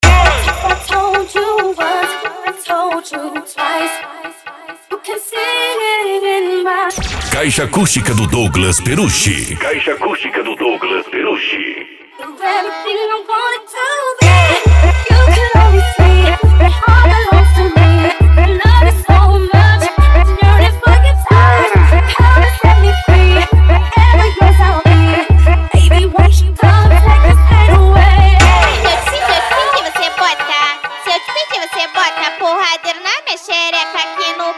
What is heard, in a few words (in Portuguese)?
especial funk mega bass